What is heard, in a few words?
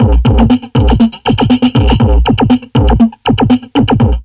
Drum Loops Crazy Processed